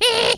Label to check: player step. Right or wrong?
Wrong